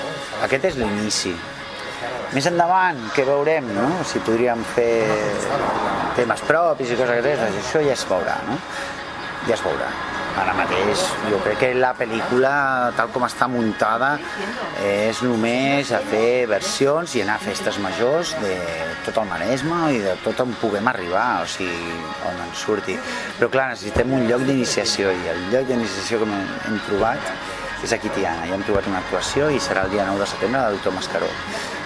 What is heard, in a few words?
groove